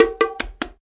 ethno fill, DRUM LOOPS and BREAKS, FILLS and ROLLS